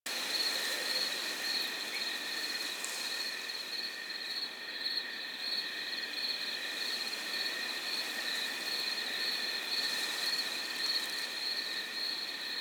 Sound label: Exterior Foreground Field Loop; Ambience; Duviri; Warframe